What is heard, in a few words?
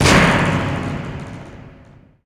Jingle Game Over; nippon shuzan renmei kanshuu itsudemo soroban ds